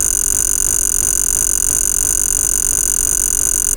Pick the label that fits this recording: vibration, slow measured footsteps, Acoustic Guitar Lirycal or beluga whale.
vibration